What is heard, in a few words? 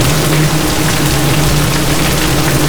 thermal charge; noxian; LoL; urgot